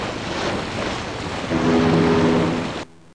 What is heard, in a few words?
steamshp; Mr. Wonder's Greatest Toyshop on Earth; OmniMedia